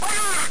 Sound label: Digit Ouaaahhhh; Puffy's Saga; UBI Soft